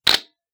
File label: d3s shutter